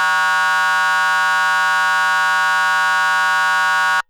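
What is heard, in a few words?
Federal Signal PA640 Yelp, Siren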